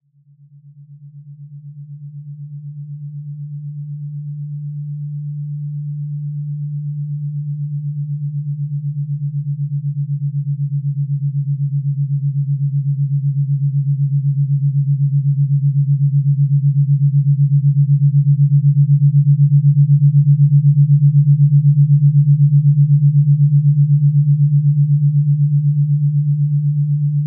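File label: 8Hz Alpha Waves, Binaural Brain Waves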